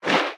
creature baby penguin swim fast

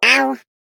spy penguin vo hit wall

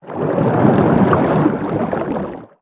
creature swim slow; chelicerate

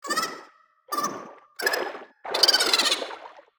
creature trivalve tickle

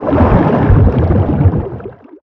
creature swim fast; shadowleviathan

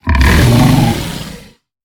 creature snowstalker distant call

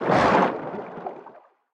creature squidshark swim angry

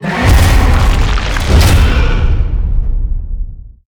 creature exo attack enter; shadowleviathan

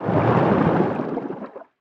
creature arctic ray swim fast

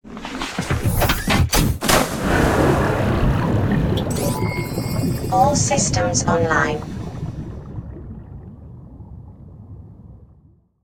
Enter seamoth right

